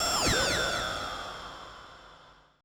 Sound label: AMBI FX